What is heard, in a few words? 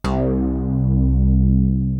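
SYNBASS, synth bass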